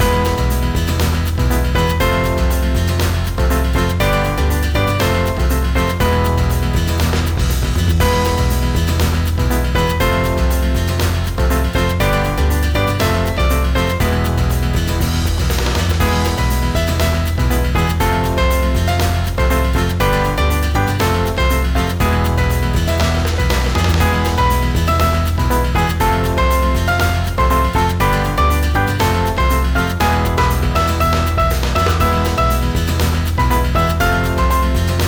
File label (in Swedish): spanskt piano